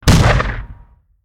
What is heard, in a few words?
World Cannonshot; angry birds epic android ios mobile 2014